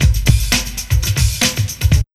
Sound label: ROCK 132 loop, Big Beat Drumloops